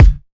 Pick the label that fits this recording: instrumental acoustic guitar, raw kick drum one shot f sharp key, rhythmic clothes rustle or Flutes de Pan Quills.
raw kick drum one shot f sharp key